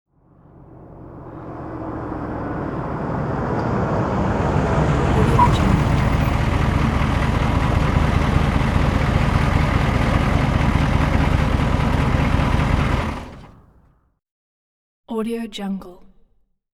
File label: truck humvee medium in idle and off; hollywoodedge